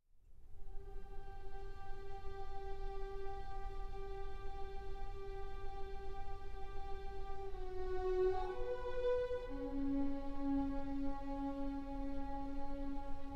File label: Percussion Concerto; III. Down